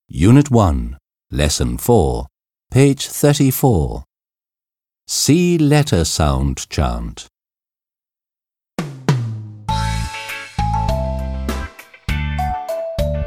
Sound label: Letter Chant